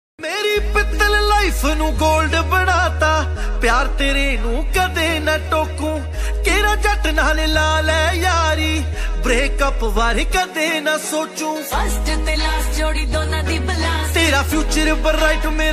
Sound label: Punjabi Ringtone